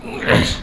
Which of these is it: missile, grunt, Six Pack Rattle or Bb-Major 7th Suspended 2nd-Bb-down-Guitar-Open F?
grunt